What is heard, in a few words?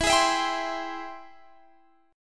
Ab Augmented Major 7th E, guitar